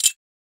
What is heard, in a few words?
Perc Spoons, Misc Percussion; Drums